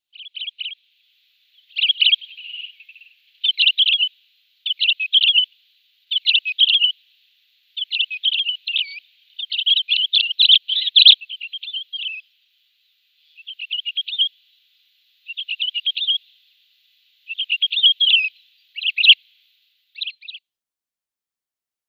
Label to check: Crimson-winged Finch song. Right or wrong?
Right